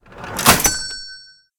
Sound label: buy